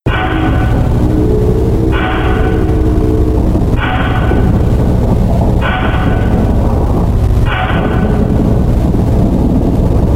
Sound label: Amb; Spooky's Jumpscare Mansion HD Renovation; ps4